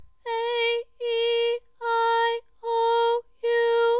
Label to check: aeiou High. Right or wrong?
Right